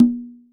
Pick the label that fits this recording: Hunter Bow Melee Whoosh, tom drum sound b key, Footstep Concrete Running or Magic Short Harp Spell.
tom drum sound b key